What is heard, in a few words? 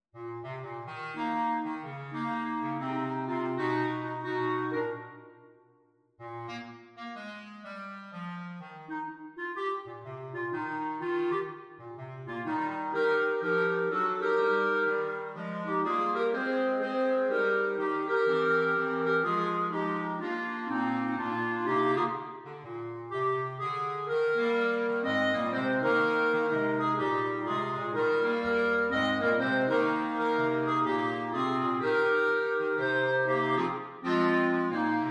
Clarinet, clarinet quartet; here we come a wassailing